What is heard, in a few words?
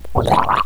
gurp; quake2; nore